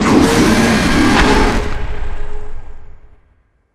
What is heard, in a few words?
jumpscare; critters; nightmare criters